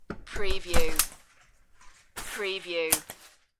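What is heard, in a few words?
Umbrella Close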